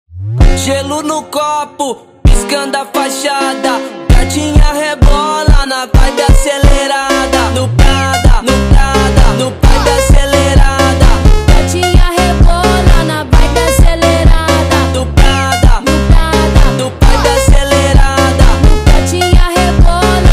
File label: Phonk